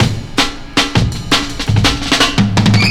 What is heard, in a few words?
165, Drum Loops, Drum & Bass; Total Drum&Bass